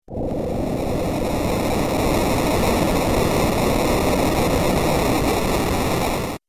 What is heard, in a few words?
big earthquake; ff3us